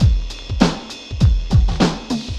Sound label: drloop, drumloops, Vinyl Attack; Masterbits